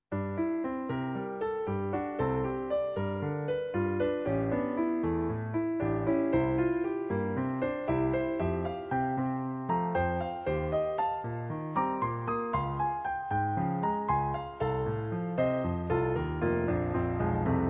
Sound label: Piano folk; Habanera